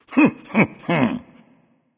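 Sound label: "heh heh", nonverbal; smite; voicepack; khepri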